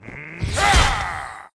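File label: ghost captain attack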